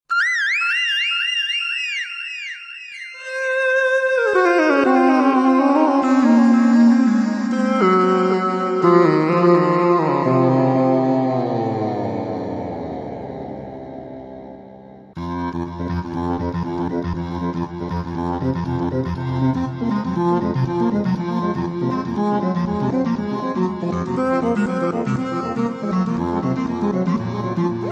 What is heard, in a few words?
V-Synth; the song develops by adding on arpeggios, electronic percussive patterns, and special effects, all built-in; Poppy Jam; Howard Scarr